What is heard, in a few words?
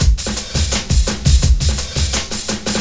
LOOP, Eurotech; Sounds Good